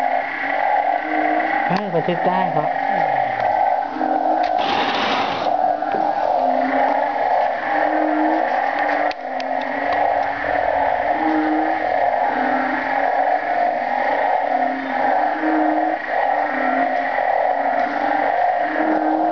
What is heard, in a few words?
toad sounds